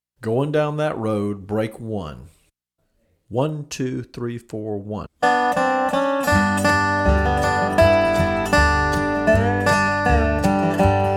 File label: Going Down That Road Fast